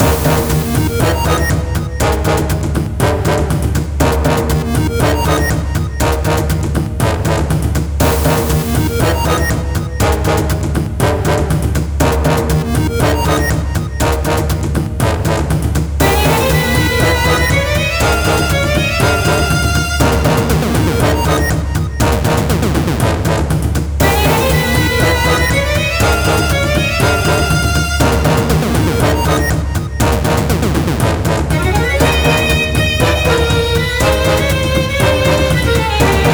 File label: bgm dungeon